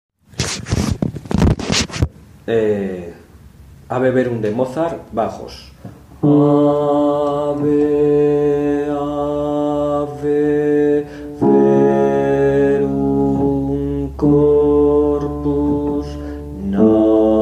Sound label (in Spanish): bajos